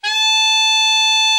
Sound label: alto sax; Best Service; Killer Horns